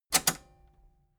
metal button robust spring click enable fast

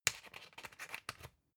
usb port metallic in hard

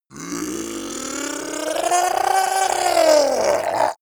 zombie human change

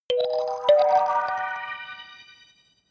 notification kalimba magical triad up minor high

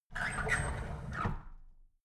drawer big squeak close regular

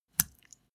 step barefoot wet hard

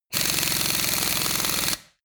impact wrench screw single long dry

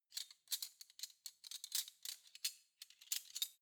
cutlery spoons handle soft

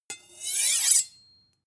sword blade grind

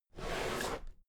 drawer cabinet equipment open regular halfway

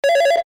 phone electronic ringing

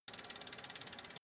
bicycle street freewheel

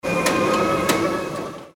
forklift truck

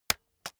keyboard external space hit slow

